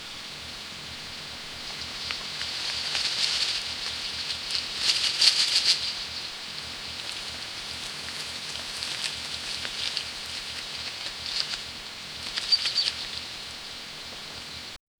light rustling of leaves, forest

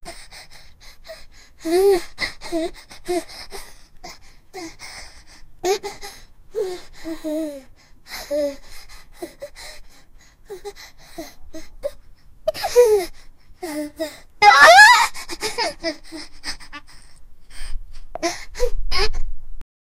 a child 8 years with, crying

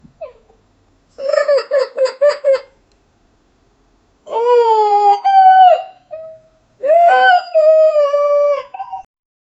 dog crying in pain

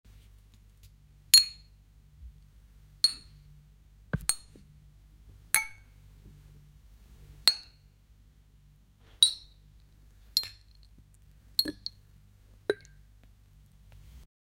subtle slicing sounds mea; culinary

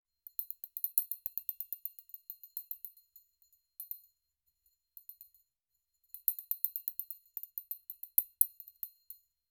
a thin stream of soft, sweet

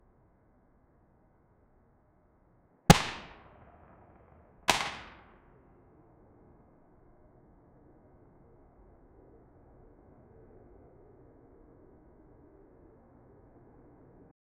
revolver shoot real life, realistic

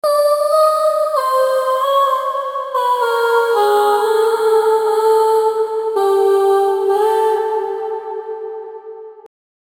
a sophisticated deep hous, house

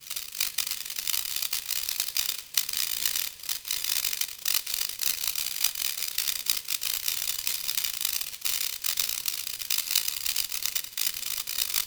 web, action; spider-man

